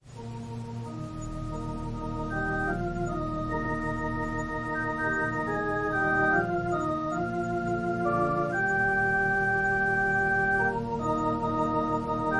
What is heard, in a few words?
Organ prelude